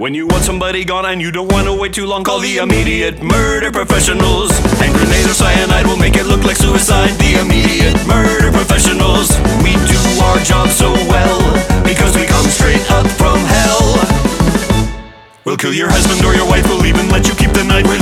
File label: Jingle; Helluva Boss; Season One Soundtrack